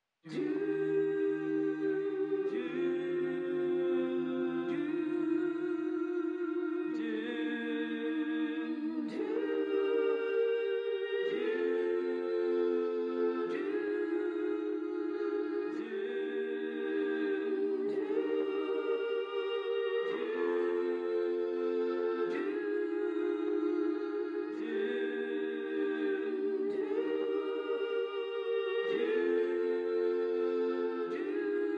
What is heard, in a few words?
Voices Of Soul